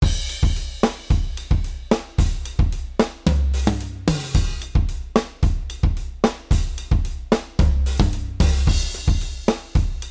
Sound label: modal surf, drums